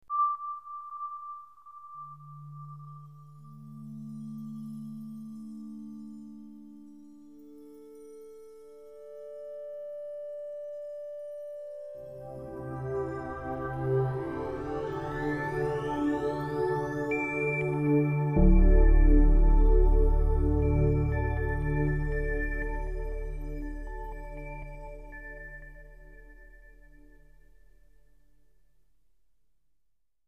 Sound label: Start Jingle